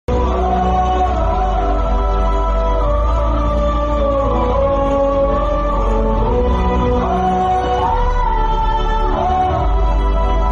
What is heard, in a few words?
sad bgm ringtone; das ka dhamki